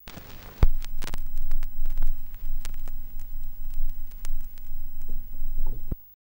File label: Record Noise